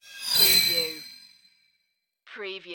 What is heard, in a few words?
High Pitch Hit Feedback